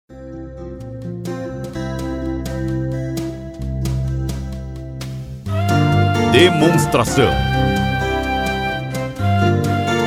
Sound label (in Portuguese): Trilha